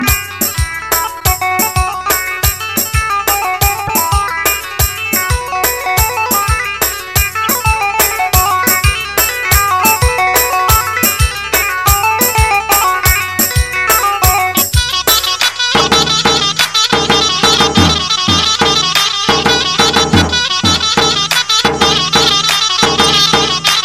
afghani pashto

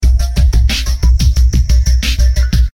Funk

